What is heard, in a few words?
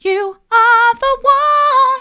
mid, vocal